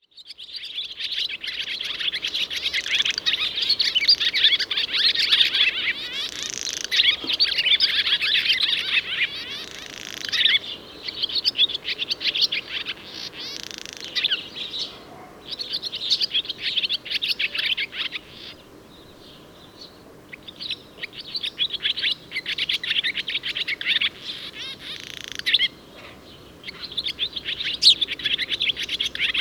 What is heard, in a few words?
barn swallow hirundo rustica; singwarte